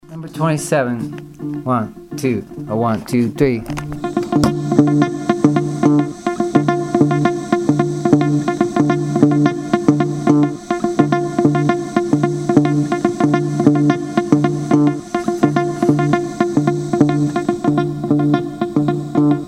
mozambique patterns

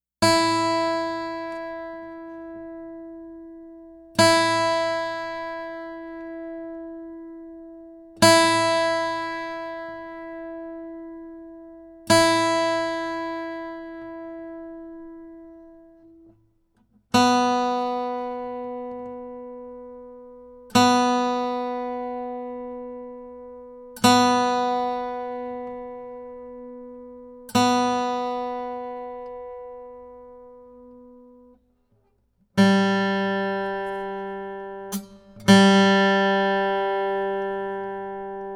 Tune Up

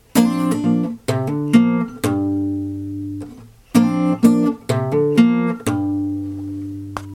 e shape minor embellishment lick

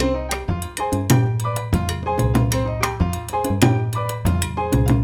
mambo

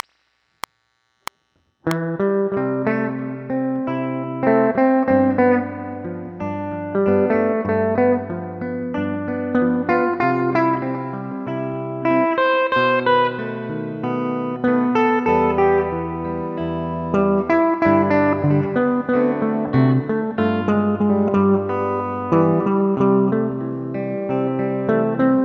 at 94 bpm

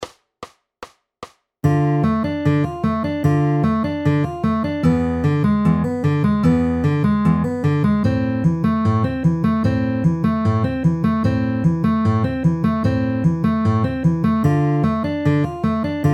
Dm E7 Am Am pinch at 150 bpm

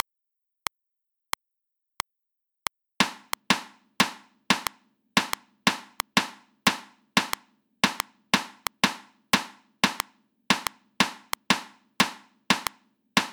reversed rhythms 16ths at 90 bpm

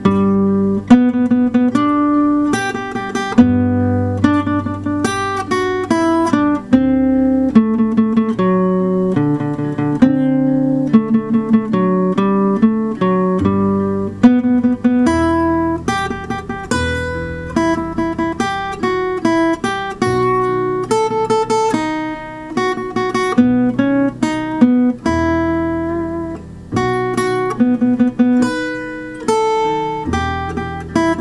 16th note etude melody at 72 bpm

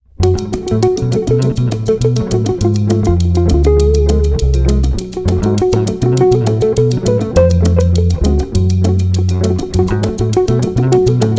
harmonic minor b5, guitar

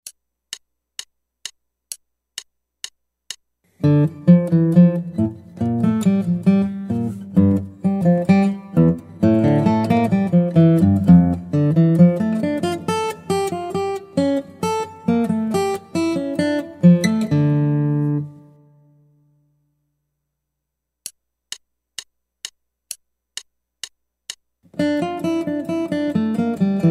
Guitar

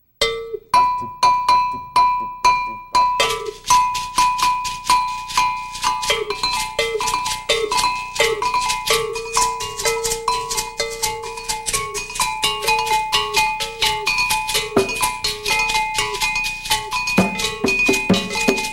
basic pattern embellished

